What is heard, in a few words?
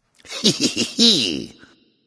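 "heh heh", nonverbal; smite; voicepack; khepri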